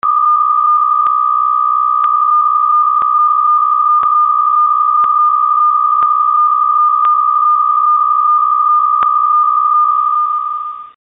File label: psk63f, funk